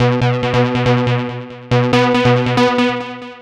NRG Lead, trance; Sonic Foundry (Sony Creative Software); Trance NRG VOL-1

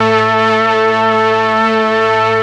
STR, STRING

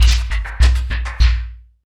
Industrial Loops

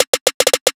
Trap Drum Fill

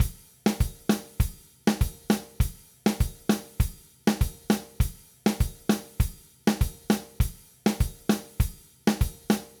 Zouk, Latin Percussion, Drumset; Sonic Foundry (Sony Creative Software); Joe Vitale Latin Percussion

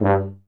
G2 TUBA

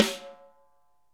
snare dry; Real Mega Drums

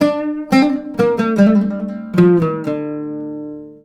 LUTH F, Arabian Traditions, LUTH RIFF; USB Soundscan vol.43; AKAI

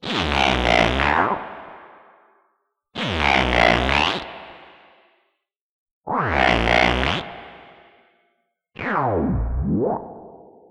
Odd Modular; radiosonics

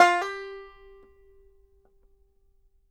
BANJO NOTES, G 4; Sampleheads; Whole Lotta Country 1 & 2

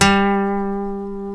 A, funk guitars

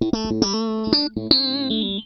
FAZE PLUK, Funk Guitar; 90 s Sample CDs; Zero G

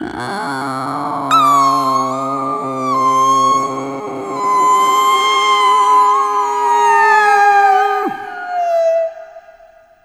Arrythmic Atonal; Sonic Foundry (Sony Creative Software); ILONA

